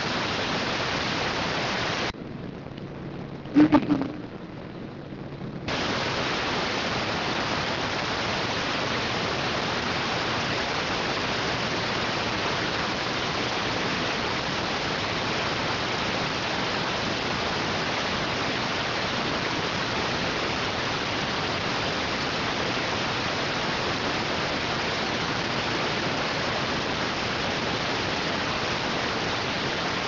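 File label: radioteletype receive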